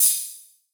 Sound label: Open Hihat; osu